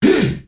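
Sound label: MALE HEHA, SHOUT; Spectrasonics; Heart of Africa 1; CH. SHOUT2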